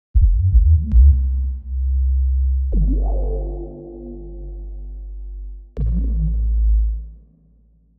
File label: 120 C Sub noodle, Bass Loops; Touch Loops; BlissedOutBeats